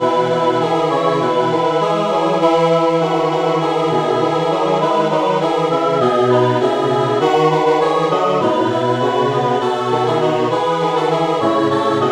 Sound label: SATB